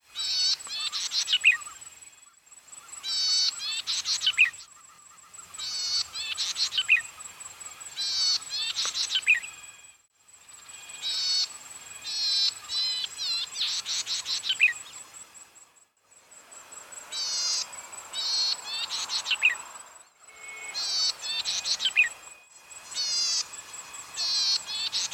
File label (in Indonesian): suara cici merah